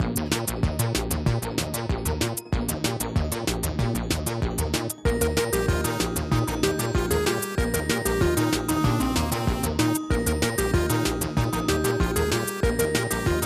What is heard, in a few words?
Music Candy Armor Loop; Adventure Time Game Wizard Draw Your Own Adventure